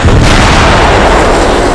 explode; dougie

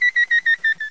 bleep; ambience

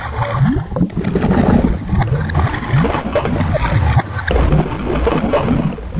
bubble machine low

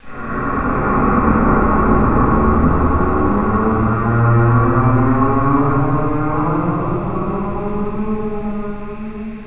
groan

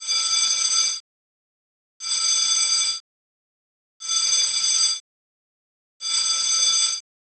crane warn; polarcomplex

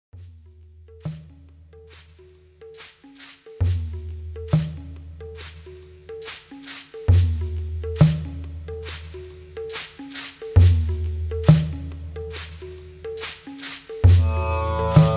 chill

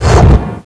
warp drive; ambience

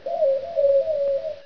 western owl

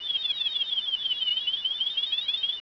computer bleep; ambience

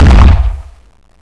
stomp, ambience; renasaur

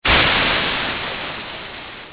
gun cooldown

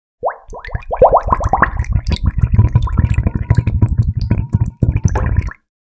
bubbles

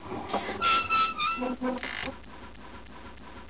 sign rotate

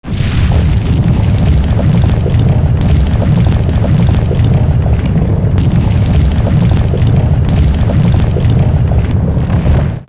stone move

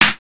slap

